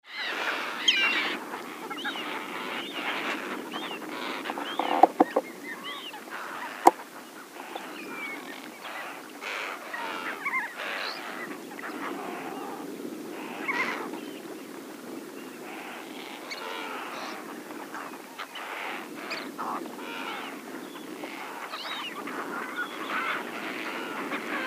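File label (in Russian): morskoy golubok golos, slender-billed gull voice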